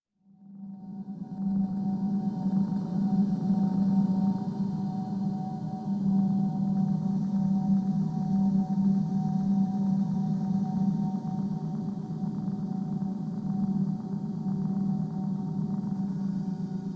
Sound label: desert atmos; sonniss gdc